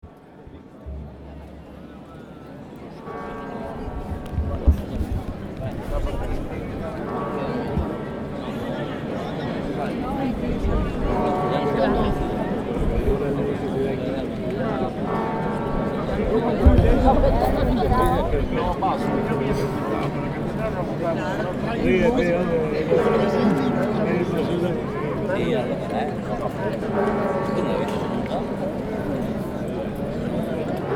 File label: Noon Bells in Santiago de Compostela